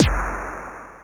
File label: Pit 909 S1, dry perc; Quasimidi Rave-o-lution 309